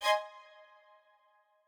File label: strings